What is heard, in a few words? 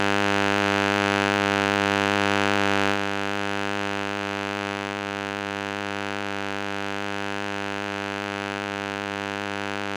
Electromagnetic Fields